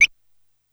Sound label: D6 Bird Tweet, SFX Kit